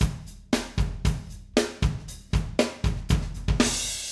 Acoustic Live Drum

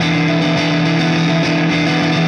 Clinical Distorted Funk Guitar

